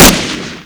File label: flechette fire; tib; MP44